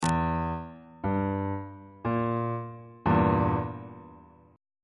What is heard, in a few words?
e diminished chord on bass clef